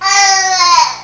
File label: baby coo, household; Web Clip Empire 150,000